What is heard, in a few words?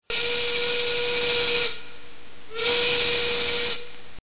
whistle